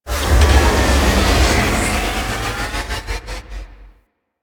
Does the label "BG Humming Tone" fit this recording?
No